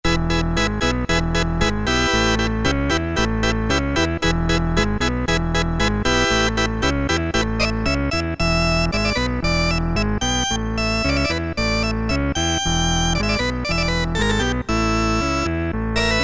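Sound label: Cheesy Organ, Roland Alpha Juno-2; Factory A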